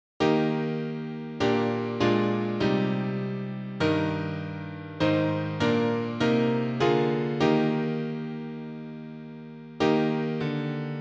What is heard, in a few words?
Abide with me piano; Weihnachtsprojekt 2024